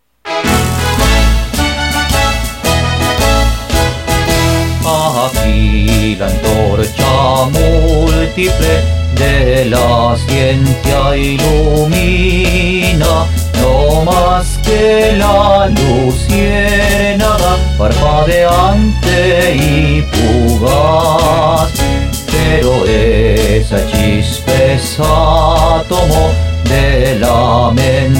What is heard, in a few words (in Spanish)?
himno